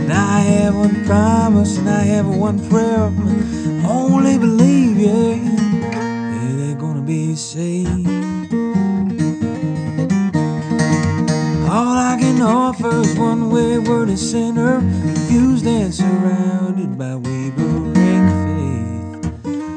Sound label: blues